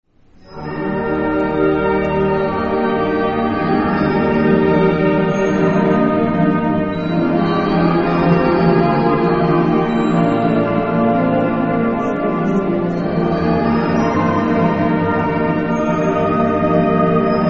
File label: banda; messe; lluno